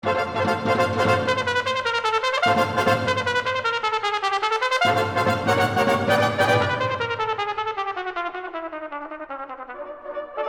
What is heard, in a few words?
Trumpet Brass Band; Concerto No. 3 for Trumpet Brass Band